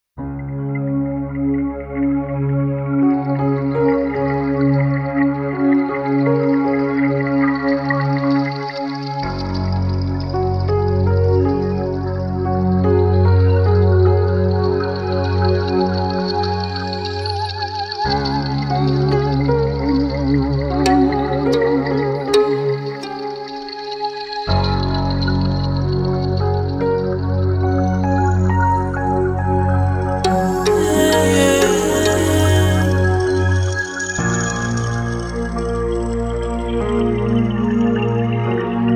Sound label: Semi almost professional remix